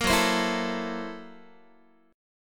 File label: F sharp 7th Sharp 9th Flat 5th Guitar, chord; 13,14,15,14,13,x; 4A,4E,5A,5D,5Gsharp,6Csharp; strummed; JGuitar